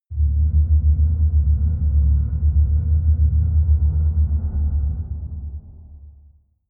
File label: machine room drone